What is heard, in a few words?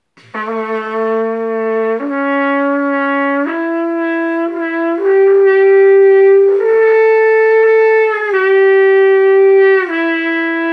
transverse trumpet open notes